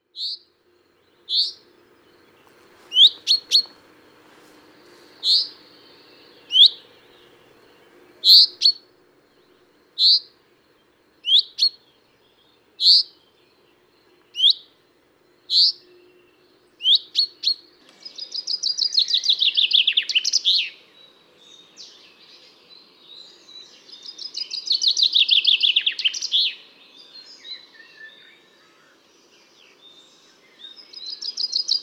Pinson des arbres

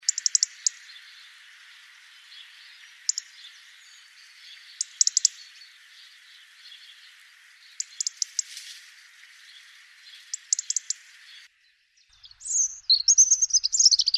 Rougegorge familier

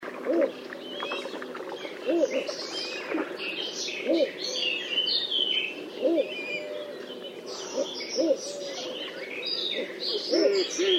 Blongios nain

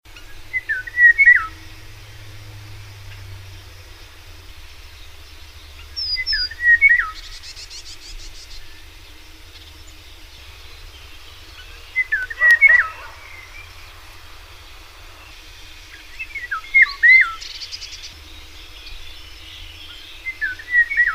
Loriot

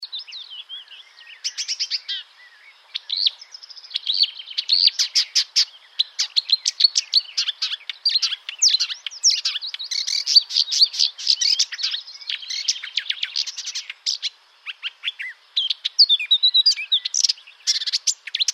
Rousserolle verderolle

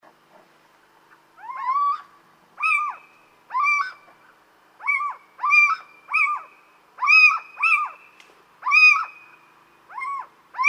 Chouette cheveche

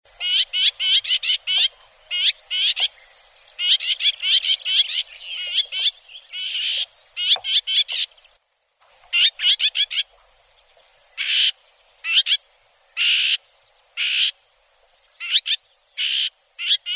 Fauvette grisette

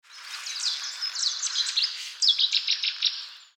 Bouscarle; Satenay; MixPre; Telinga

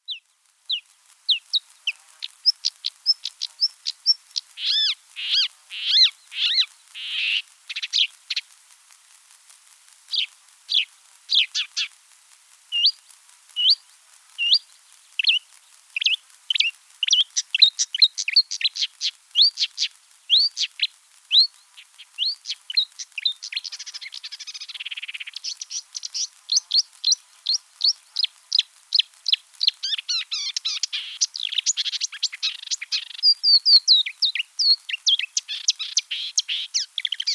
Rousserolle verderolle, marais; marais Frane

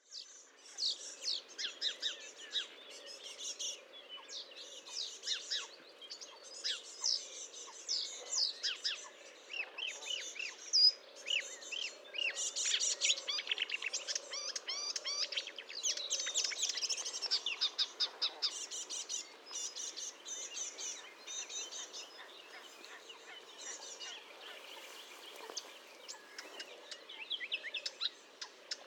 Rousserolle verderolle; Fontaine-Francaise; Nagra; Telinga; Acrocephalus palust; Fourneau

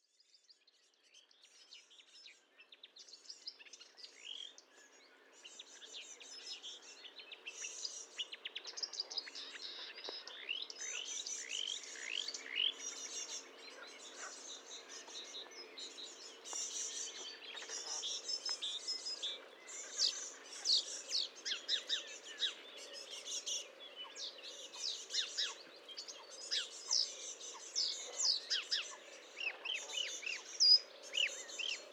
Rousserolle verderolle; Fontaine Francaise Fourneau; Nagra; Telinga

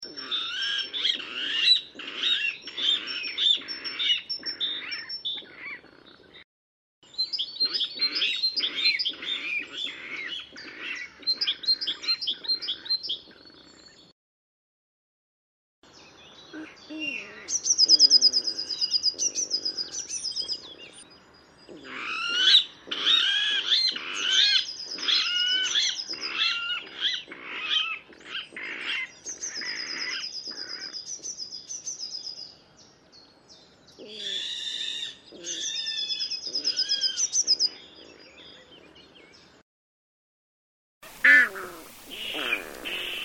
Rale deau

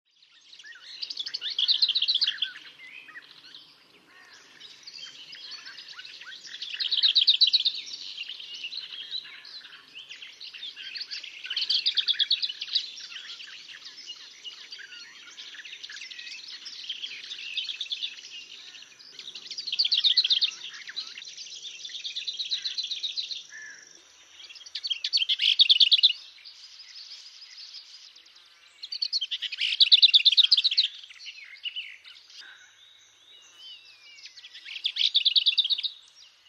Fauvette babillarde

